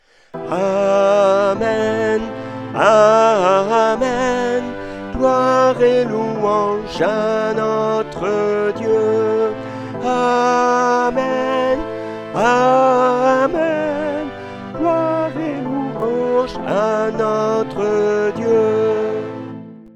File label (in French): DOXOLOGIE solennelle SOPRANE